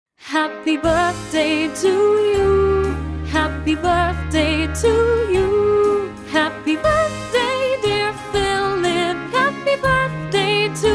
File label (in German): happy birthday phillip